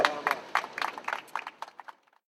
level complete clap; Kids Games; DribbleKingsFootball

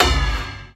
snake; Snake&Ladders

